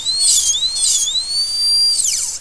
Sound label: DENT DRIL